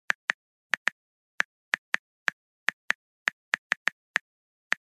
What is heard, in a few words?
Mobile phone texting